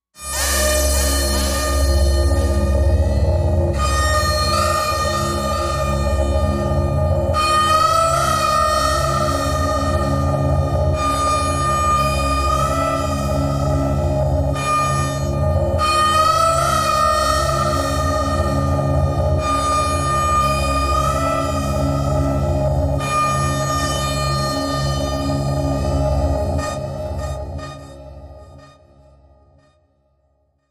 Hull Breach Alarm Cryin, eerie ambience

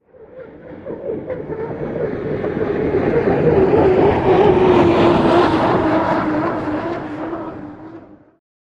Hydroplane By Fast

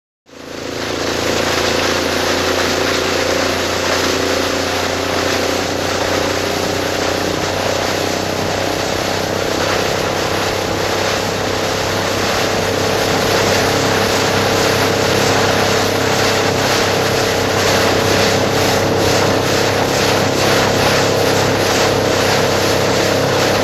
Power Boat Bow Wash

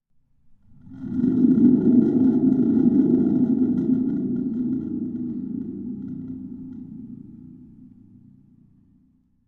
Spring Drum Big Shake

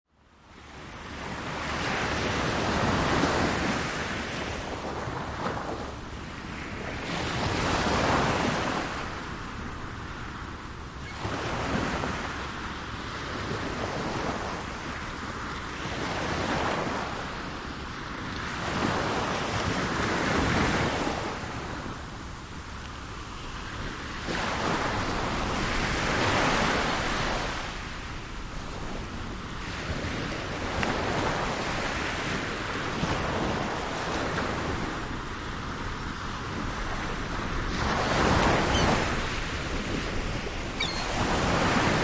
Small Defined Ocean, waves & surf

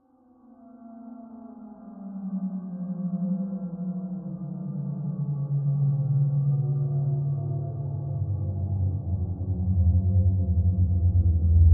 Bed Descending Ghost

